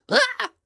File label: Jump; Collector